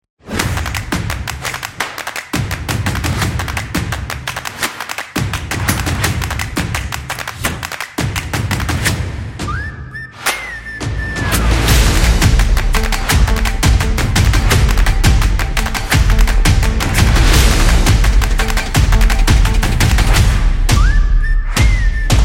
Intro Opener

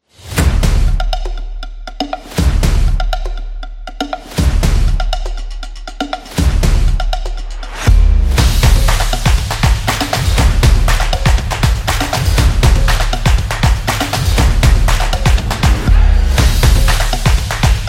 Commercial Ad

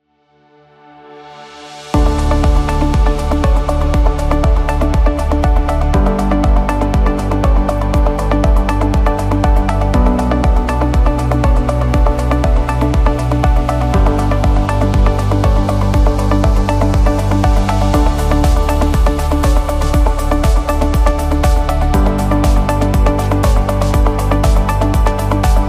Infomercial

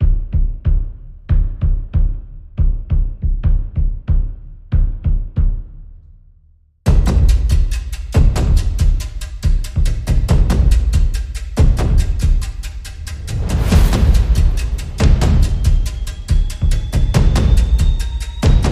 Tribal Percussion

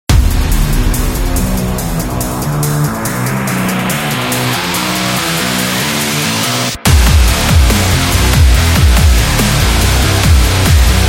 Dubstep Rock

